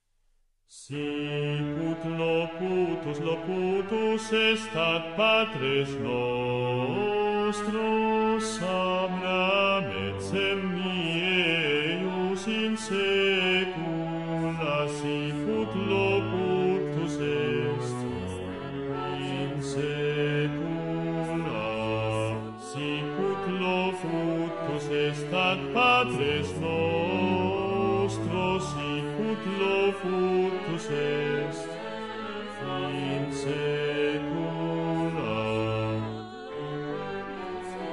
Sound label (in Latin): Basse; Sicut locutus est